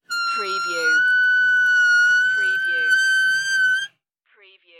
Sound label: squeaky water pipe